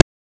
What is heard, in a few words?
Rimshot - Rim, Wiard 300-Series Modular